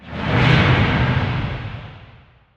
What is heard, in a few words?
fire shield; weapons; dota; ogre magi